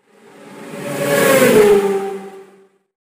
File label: Bike Race